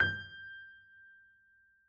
piano g-2; Golden Crayon Sounds

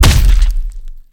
fists hit; Original TK Sounds

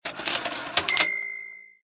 get cash; RS Games Sounds; Monopoly

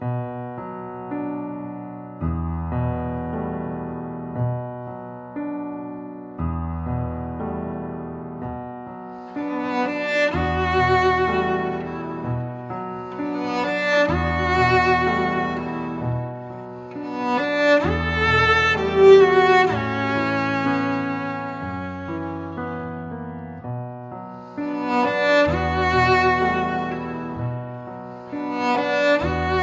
chilling motivation; Manamon Music; Manamon 2

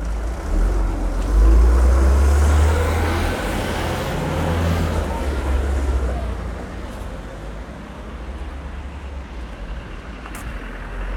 bus passing; Golden Crayon Sounds

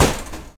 wall metal; Golden Crayon Sounds

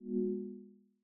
navigation push; iOS 27

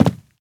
wood land; Golden Crayon Sounds

